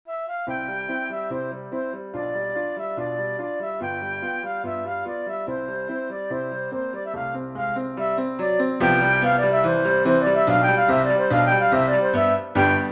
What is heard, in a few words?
allegro from the sonata major for flute piano